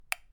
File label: Light Switch